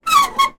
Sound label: metal handle movement squeak rusty old; zapsplat